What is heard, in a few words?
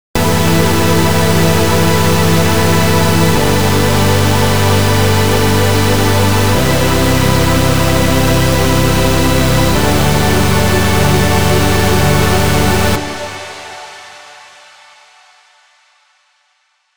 Supersaws Chord